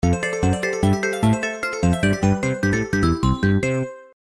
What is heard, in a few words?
win; mario vs donkey kong tipping stars; 3ds; smb2